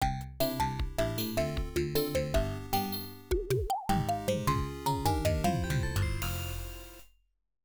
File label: Logo (OPN); FMP Music Disk III